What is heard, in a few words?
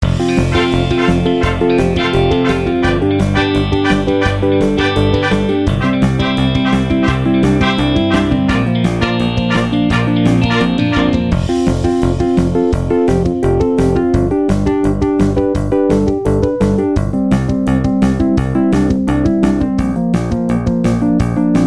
Instrumental